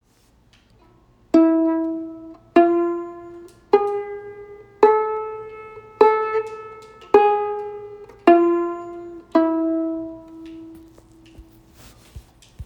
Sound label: plucks